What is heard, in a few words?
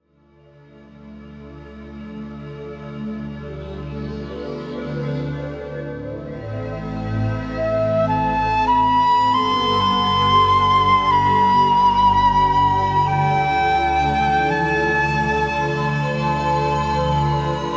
Rainforest Longing